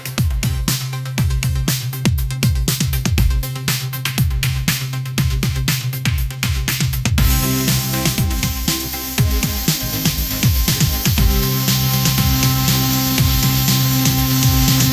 upbeat pop melody, ringtones

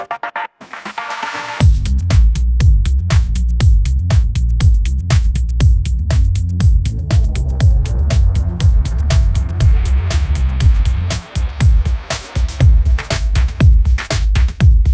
upbeat pop melody, ringtones

